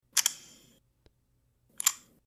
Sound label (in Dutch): locken ontgrendelen iphone